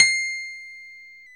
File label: KEY MKS, Pop Pianos, Rhodes